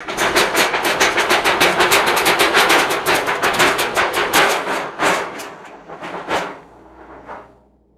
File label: thin sheet metal rattling and vibrating